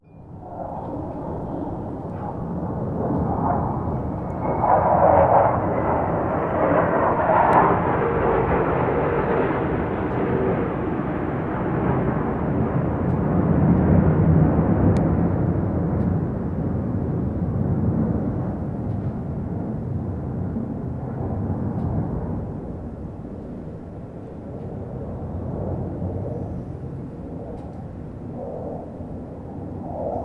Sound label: f16 fighter jet passing by